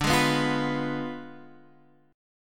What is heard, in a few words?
D Diminished 7th D, Guitar, Standard; Ddim7 strummed; x,5,6,4,6,7; JGuitar